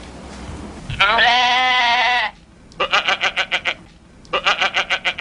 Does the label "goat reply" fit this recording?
Yes